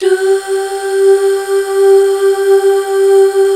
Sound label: HAL DUH F, HAL Back.Duh Fem; BACK. DUUHH